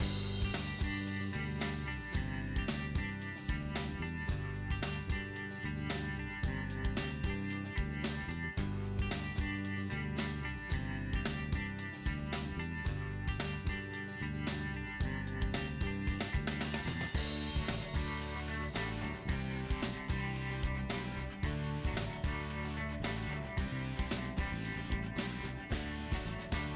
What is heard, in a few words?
music cheerful dry; bgm